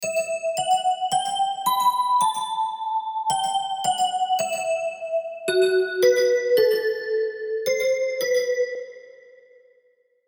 Keys; Crystal; Unison Billboard "Hot 100" Collection for Omnisphere

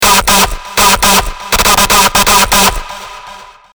SCREECH LOOP (160BPM G)

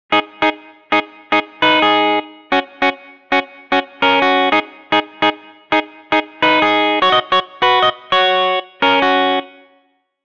G#m 100; Pretend; Unison Country MIDI Melody Collection

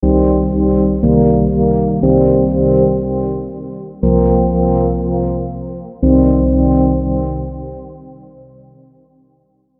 Minor Pentatonic Prog; Unison Minor Pentatonic Advanced Scales MIDI Collection

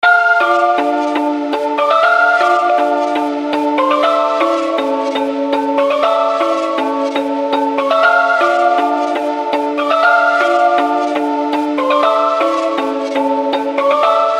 Scratch Dm 120; Dancehall & Afrobeats MIDI Melody; Dancehall & Afrobeats MIDI Melody Collection

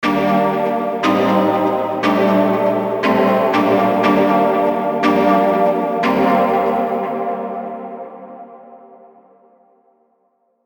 Lydian Prog; Unison Lydian Advanced Scales MIDI Collection

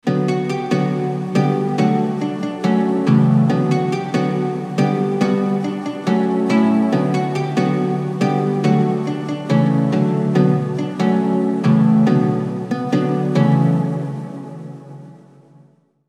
140bpm, Aeolian; MIDI; Unison Aeolian Advanced Scales MIDI Collection

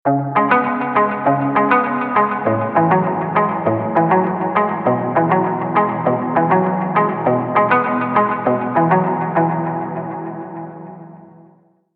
C#m 100, MIDI Melodies; Twin; Unison Essential MIDI Melodies

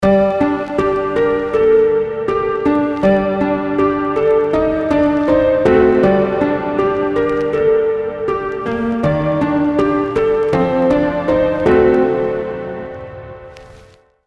Melody Gm 160BPM; Temper; Unison MIDI Starter Pack

